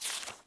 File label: page turn sound